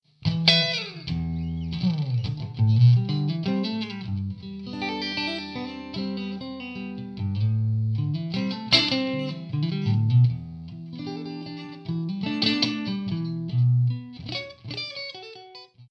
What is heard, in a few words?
sovtek 5881 wxt clean 14ma